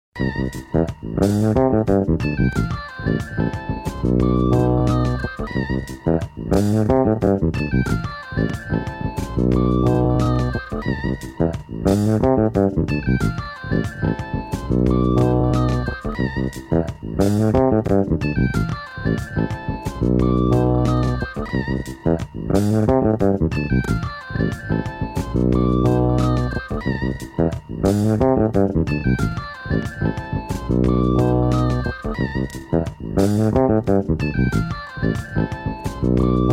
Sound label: 4 Am bpm 90; Tempo réel 2 mesures